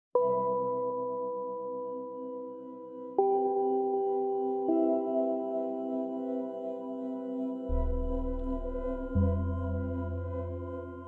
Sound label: Exploration Minimal